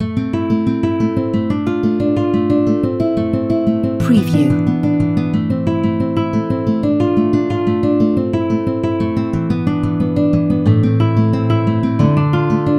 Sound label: ACOUSTIC GUITAR LATIN LOOP RINGTONE